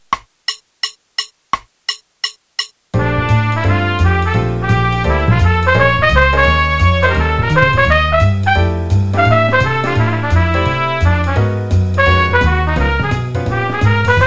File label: Jazz Trumpet Audition, qn=171; 2018 CBDA CAJ